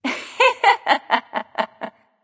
hehheh; smite; voicepack; isis

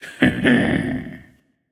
Heh Heh; smite; kukulkan

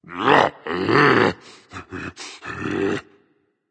grumble berserk; cuchulainn

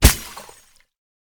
death disappear; smite; kuzenbo

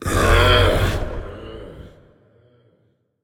"ugh"; smite; sylvanus

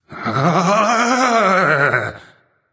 fear; smite; hou yi

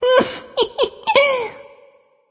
hehheh; smite; voicepack; sylvanus

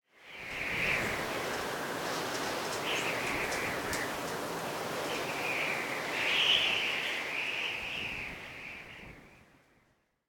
ambience whistle wind; smite

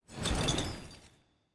armor; smite; khepri

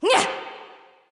grunt jump ghost; smite; voicepack; hel

